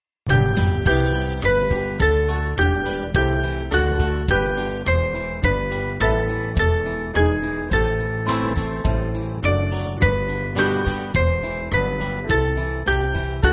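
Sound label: Band, Music Backing; Abbey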